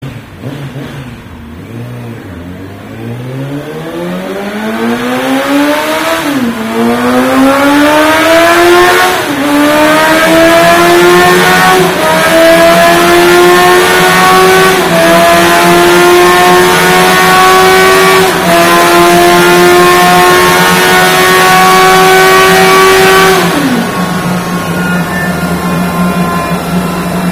gp pro evoii slip on exhaust suzuki gsxr600 gsxr750; leo vince